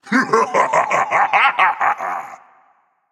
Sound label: laugh; General Nosseris; Kumbhakarna